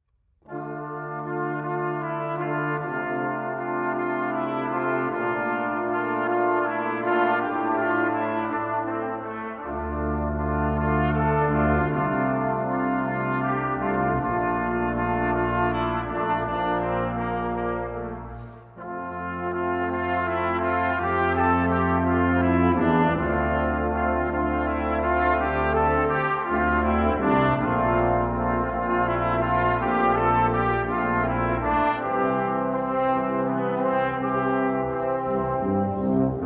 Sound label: paraphrase brass